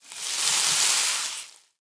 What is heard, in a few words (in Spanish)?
QUEMAR CERDO; Yesterday Origins